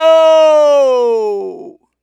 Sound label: Native Vocals, Native American